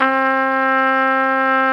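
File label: trumpet, harmon dry; Roland L-CDX-03 Disk 2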